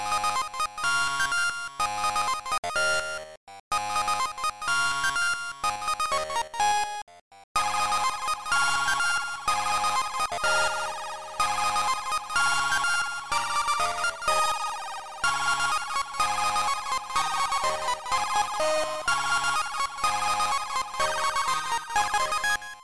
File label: japanese toy car